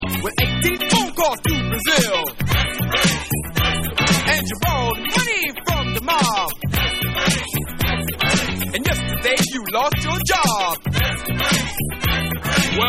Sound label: Vocal